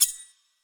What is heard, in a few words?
sword